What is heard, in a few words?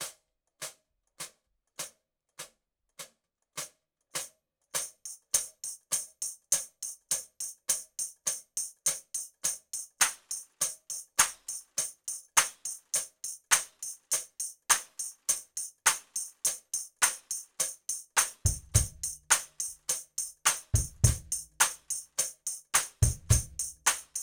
Rhythms of the Family Kit Drum Play Along